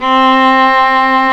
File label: STR VLA, Viola Solo, marc